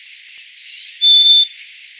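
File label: black-capped chickadee song hey sweetie; Passeriformes; Paridae; Poecile atricapillus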